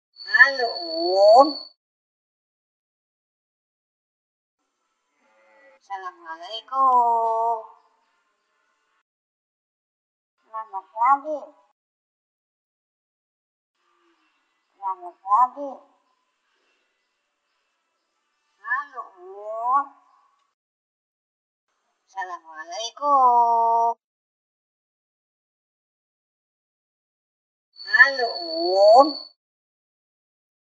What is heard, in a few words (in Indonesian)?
masteran spesial beo